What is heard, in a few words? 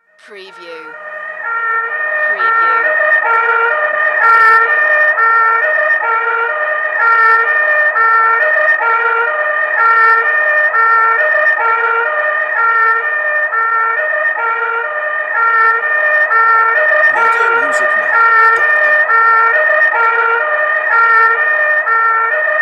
Euro Police Siren Vintage